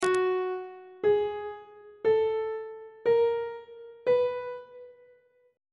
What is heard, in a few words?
f sharp 3rd interval pitches; on treble clef